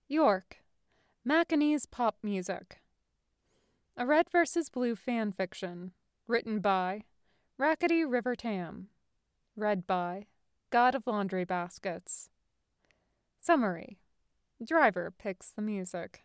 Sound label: macanese pop music